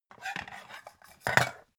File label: pan lid slide